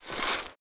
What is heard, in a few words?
bow pullback; bow deerhunter